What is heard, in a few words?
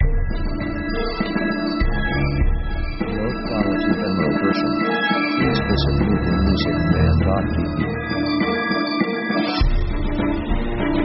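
orchestral adventure sketch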